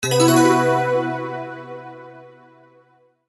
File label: start; aoyama